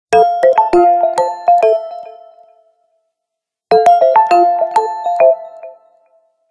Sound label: Ring; Microsoft Windows-Phone-7